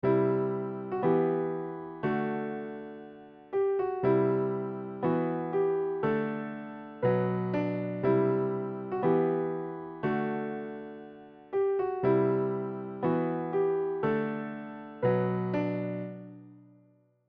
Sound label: pushed chords